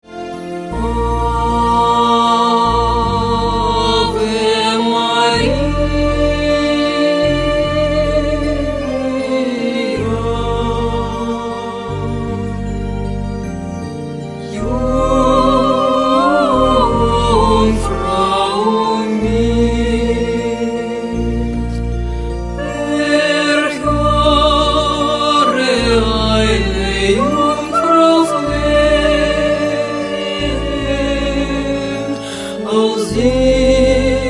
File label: Ave Maria german